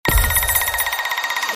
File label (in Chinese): 信息栏金额持续增加音效